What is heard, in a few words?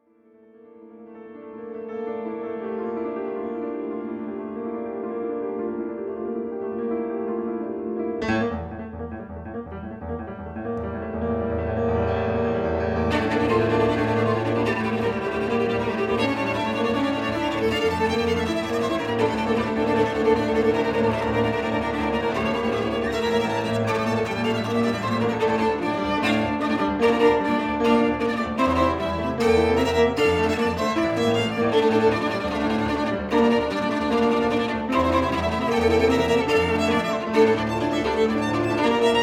Folk Dances